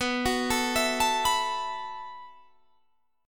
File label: B 7th Suspended 4th B